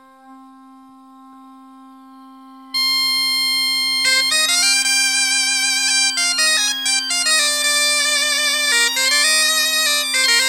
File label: Plinn Bal; Bro Dreger